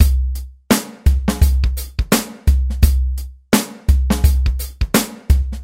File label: Boom Bap Verse; Zoom R20